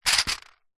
leisure game plastic tray with discs in set down on carpet floor; connect 4